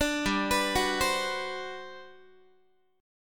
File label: G Major 11th, Guitar; GM11 Chord